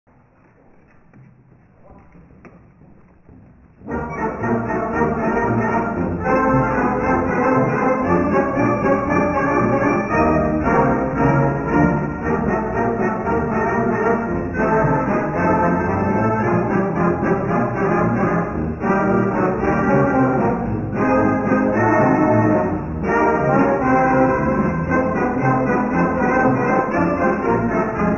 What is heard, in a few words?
Dixieland Struttin'